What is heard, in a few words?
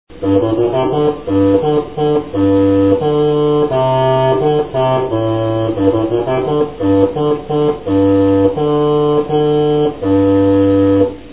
cornamuse